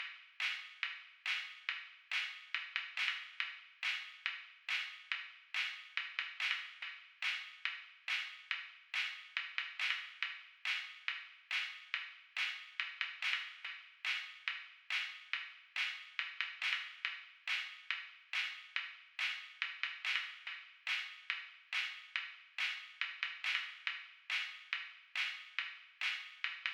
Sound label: Nostalgia Beat